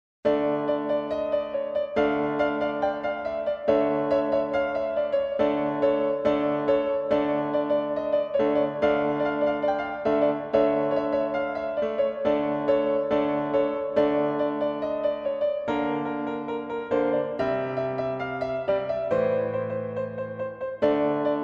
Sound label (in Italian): minuetto